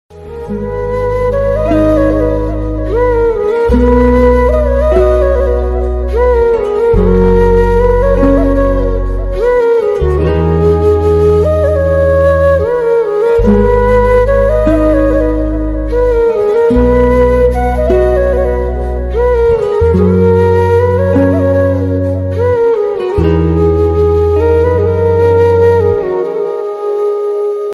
sad shayari background music breakup music sad flute ringtone